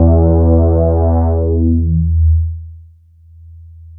bass, synths

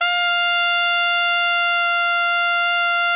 alto FF F 4, saxophones; Greytsounds; Philip Wolfe David Crozier's Saxophones

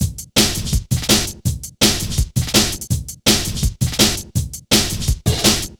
Hard Stepz, Jungle, Drum Loops; 165.5; Zero-G Jungle Warfare 1, 2 & 3; 90 s Sample CDs

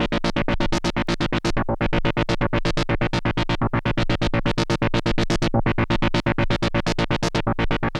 LFO 125, SYNTH SEQ

